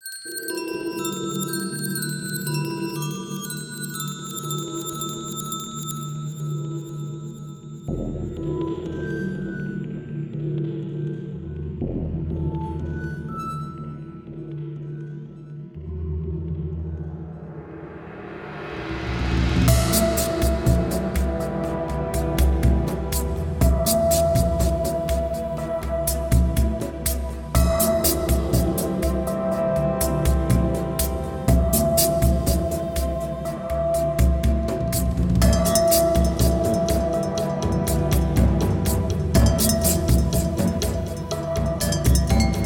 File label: sadness